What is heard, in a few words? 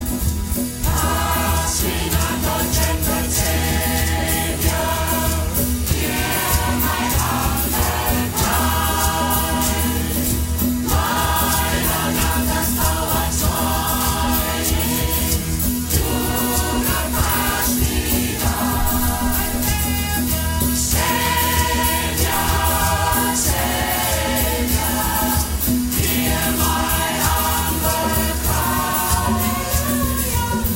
Pass me not o gentle Saviour